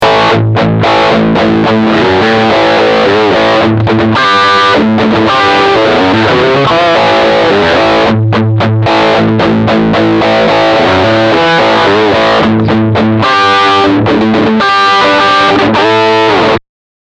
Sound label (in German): Riff Steg Distortion